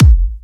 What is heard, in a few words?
Trance Kicks, Bassdrums